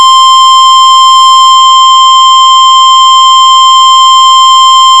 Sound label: alert steady